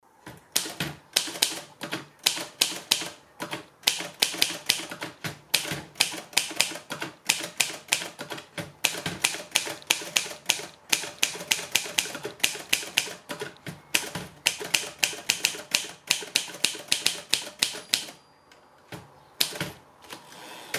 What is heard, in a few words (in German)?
triumph perfekt, Schreibmaschine; Hörbeispiel Triumph Perfekt